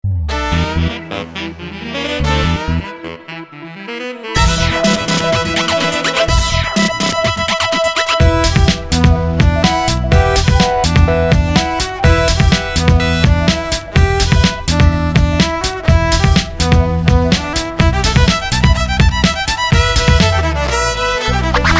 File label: russian hip-hop violin